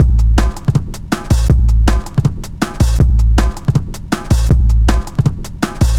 160, Drum Loops; Total Drum&Bass

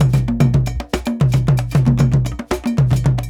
Mai, full ensemble, world percussion; Mai - Casse

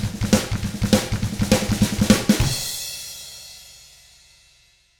SHUFFLE, Drums

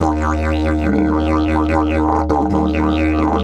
140, DIDGE RIFFS

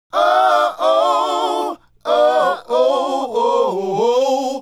Male Group, Hip-Hop and RnB Vocals

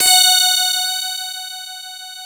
DS8 F#5, Korg; Back In Time Records; Korg Universe VOL-1

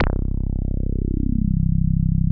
TB 303 BASS

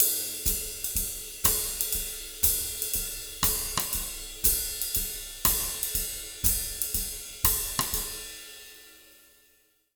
120 BBAND, Jazz Latin Drumloops

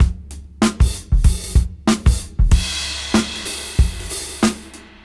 Funk 94 BPM; AMG - Keith LeBlanc Phat Butt Jams

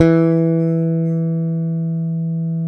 SPEC SUSTAIN, Bass; Sampleheads; Will Lee Bass Library

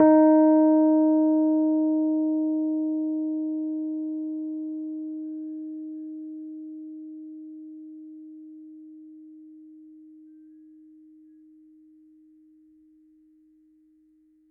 D#4 MID, Rhodes Mark II; CEPCo Sample Series Vol. 3

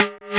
M TIMB, DRUMS; Analogon

